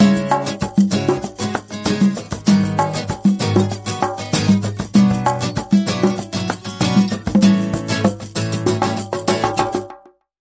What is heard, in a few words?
Urgent Call, Sting